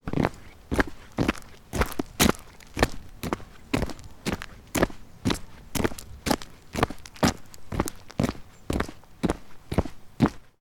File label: human footsteps forest pathway dry clear of debris; sound effects one